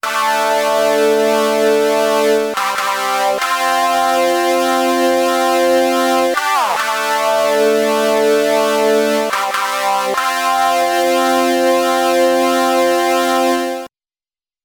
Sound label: guitar; The next patch works only nice in conjunction with a guitar amp (or a simulated amp based on VST).